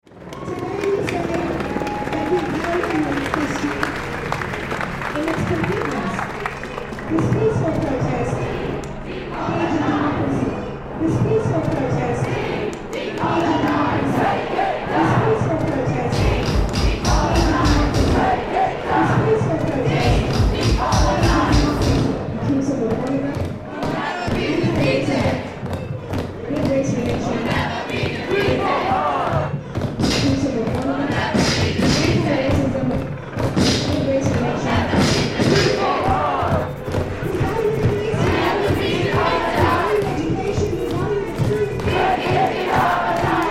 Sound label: the rhythm of Rhodes Must Fall, Oxford Rhodes Must Fall protest